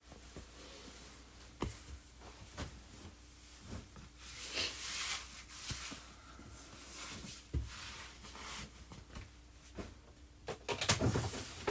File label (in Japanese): お風呂でシャワーオナニー 盗聴風オナニー実演 シャワーオナニー; 21歳Eカップ現役デザイナー; 家族が居るのに; ぬすみぎぎ